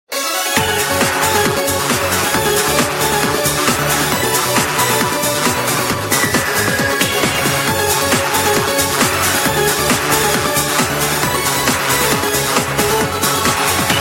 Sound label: Euro Dance, ringtones